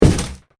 Hit box, Box, Cardboard: Impact, Slight Box Movement; Miscellaneous Impact; Stuart Duffield